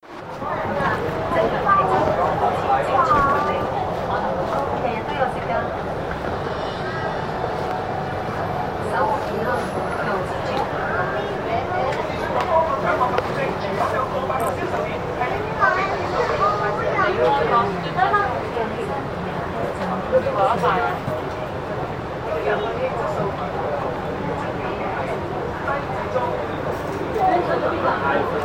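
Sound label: Hong Kong soundscape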